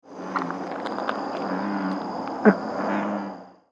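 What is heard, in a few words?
rcatesbeiana encounter, frogs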